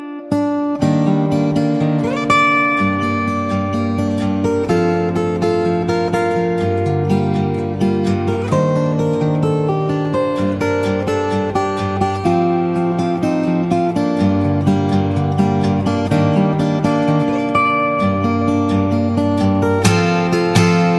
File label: Guitar pick